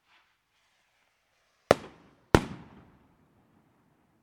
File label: Two rockets